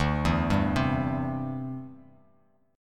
D 7th Suspended 2nd Suspended 4th D, Bass Standard; D7sus2sus4 arpeggiated